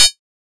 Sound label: Chh, Drum Hits; Zero-G Trance Inducer; 90 s Sample CDs